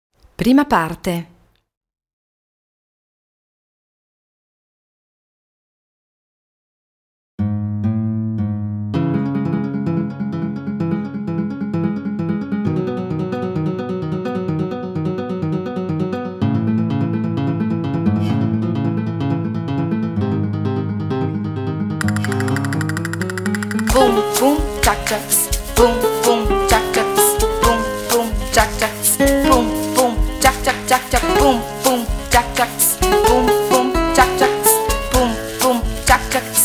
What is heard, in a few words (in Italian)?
Flamenco ritmo; traccia ritmica; Pensando al flamenco